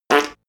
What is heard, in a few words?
fart horn